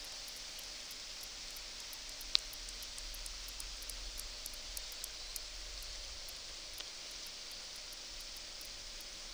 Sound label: clicks